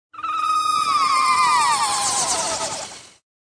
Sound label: Alien Flying, Alien baby, sci-fi, FX from human voice; Aliens